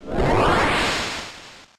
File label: Focus Punch; pokemon reborn e19 2022